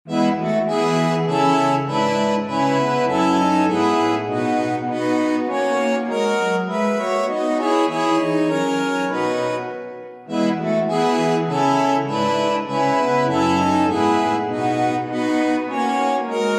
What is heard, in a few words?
Instrumental; Bach; St John Passion